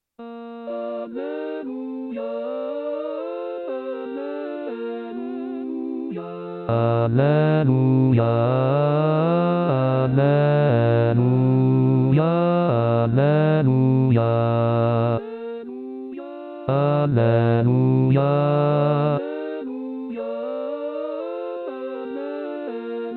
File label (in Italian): Alleluia bassi; Gabrieli; anno liturgico